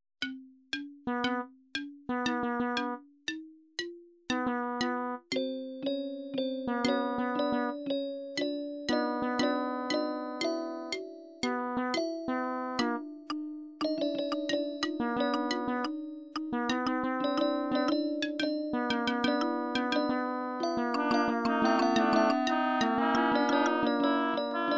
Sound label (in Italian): Dialogo fra strumenti ottavi di tono